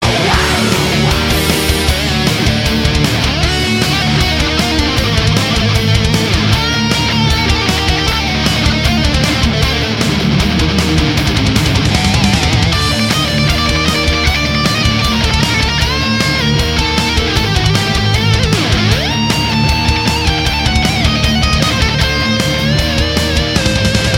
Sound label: Perfect solo